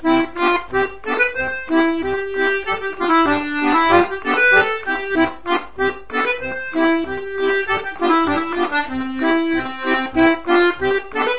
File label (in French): Scottish du Sud-Ouest - France